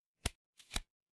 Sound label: paper fabric cloth whip